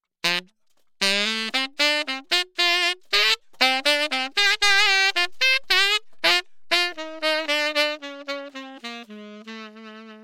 saxophone mtp940 cm; lewitt

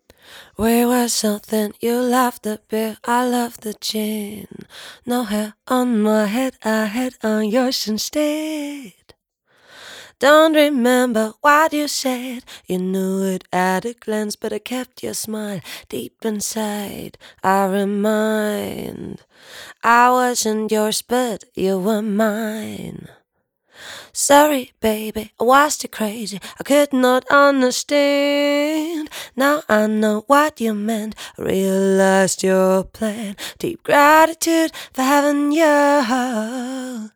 fem vox; lct540s

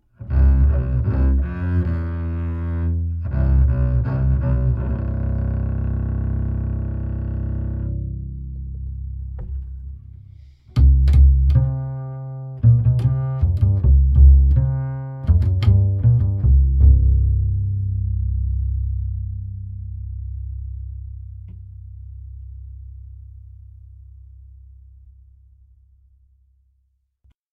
upright bass dtp640 rex dynamic; lewitt

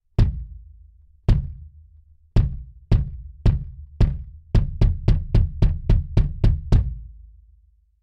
bass drum dtp640 rex condenser dynamic efr

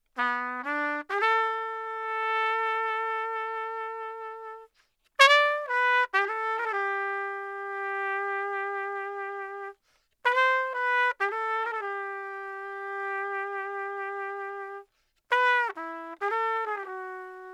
lewitt trumpet dgt650

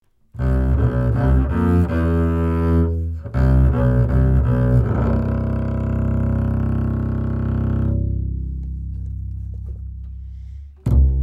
upright bass lct940; lewitt

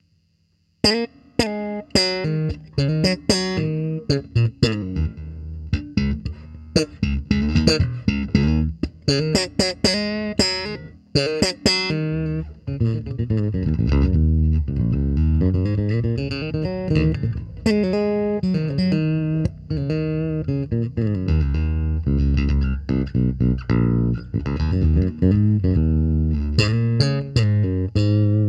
electric bass, dynamic dual; lewitt; dtp640 rex

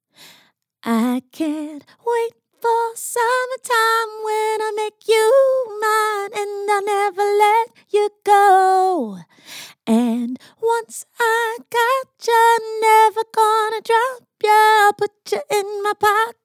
female vocals, cardioid; lct 441 flex; lewitt

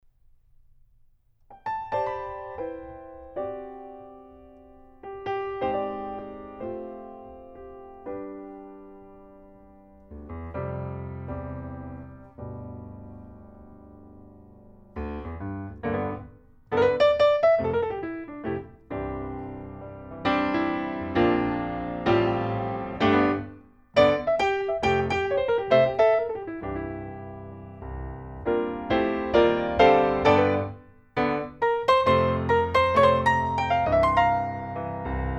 lct640ts piano supercardioid; lewitt

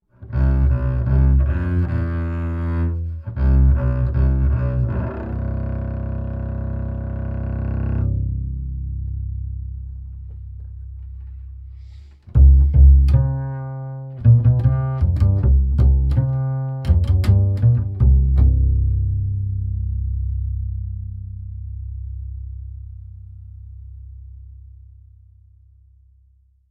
upright bass dtp640 rex dynamic flat; lewitt

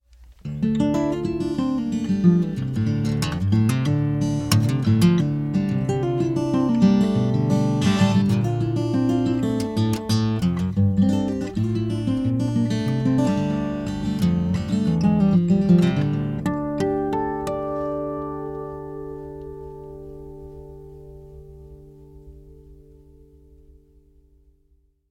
acoustic guitar mtp840 dm; lewitt

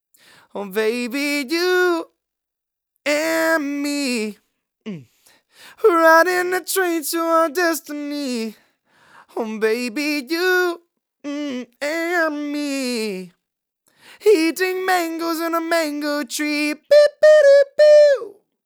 male vocals, cardioid; lct 441 flex; lewitt

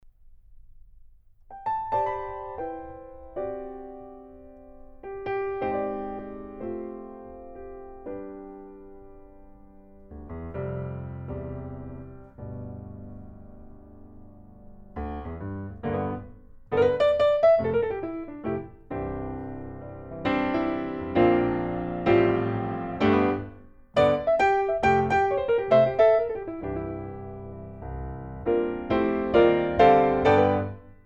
piano omni; lct640ts; lewitt

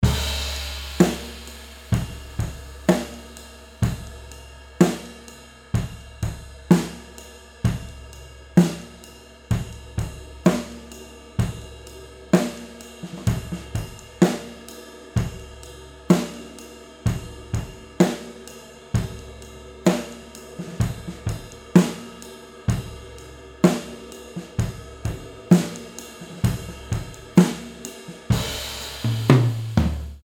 overhead omni; lct 640 ts; lewitt

